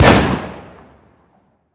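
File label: weapon mike203 fire player atmos int, launcher; gmod; viper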